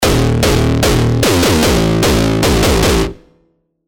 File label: Bassdrum; Serum